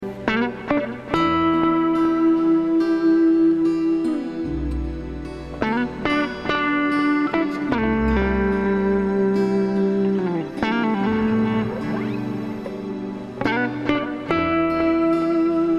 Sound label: solo guitar extraction